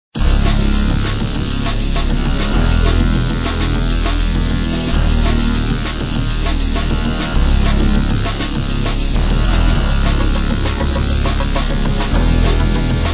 Level Evil; Heli Attack 3

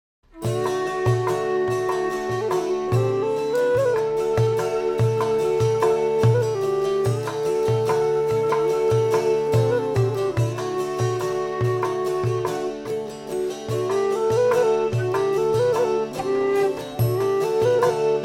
Lazy Morning (Tavern Theme)

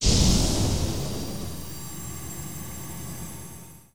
blue room orb slashed

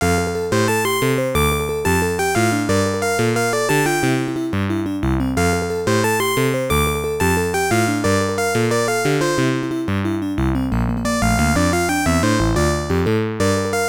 Bonus Round Music; Mappy; PC-8801

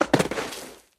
Body Tumbled

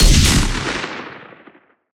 Sci-Fi Weapons-Sniper Rifle Single Shot

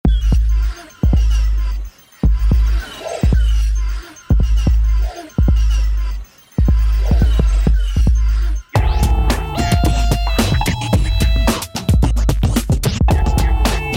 Hip Hop

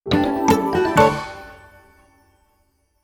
Item Jingle

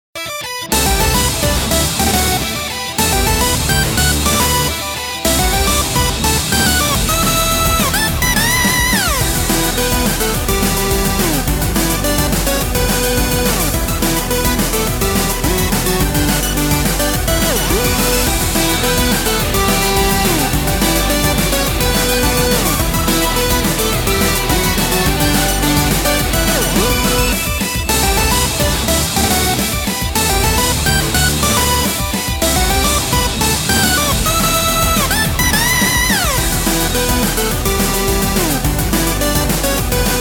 Loop (212bpm)

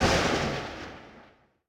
Lightning

